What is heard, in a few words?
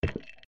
ice impact; Adventure Time - Elemental; ThrillGames